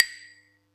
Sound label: Yamaha EX5, Drums